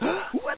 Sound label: turtle